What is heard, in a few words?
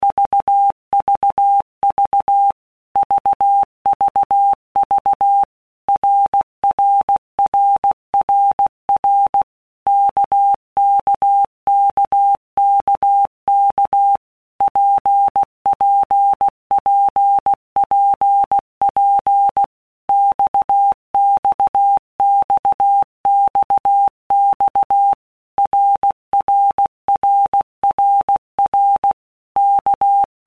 RKPX, Morse Code; Basic Sets; Basic Letter Groups By Blocks